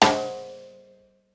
rimshot

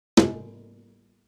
snare drum press brushes

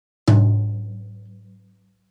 floor tom brushes

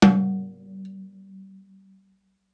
snare drum no snares

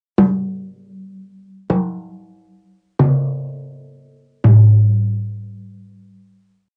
drum set mallets

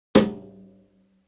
tom press brushes

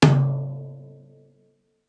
tom

